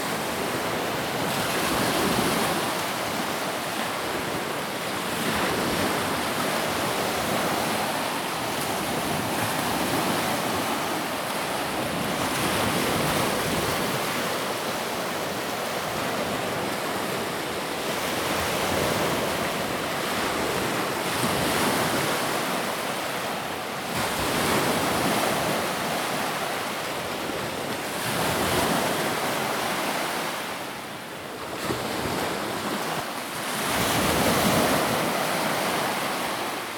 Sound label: A Walk on the Beach at Sunrise in Surround Sound 3d